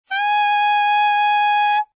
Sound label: A sharp 3, saxophone tenor